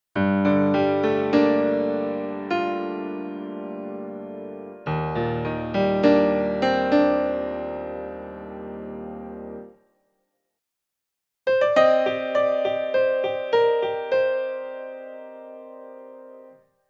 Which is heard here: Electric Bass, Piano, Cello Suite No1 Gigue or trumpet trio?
Piano